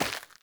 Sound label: Grunt Step Default, footsteps; Enemy; Corrupted Sproutfolk